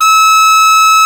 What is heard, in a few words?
Hard Baritone A#4